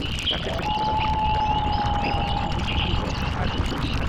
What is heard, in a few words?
ambient mix